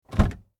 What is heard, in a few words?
Auto Open Door Interior